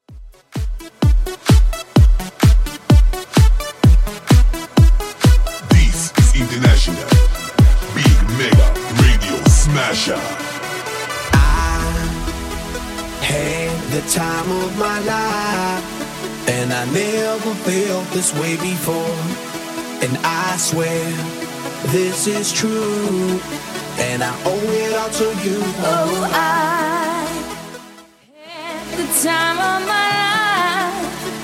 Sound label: 2ks Redrum 128bpm